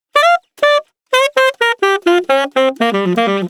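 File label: Bass Mute; саксофон; Sax Solo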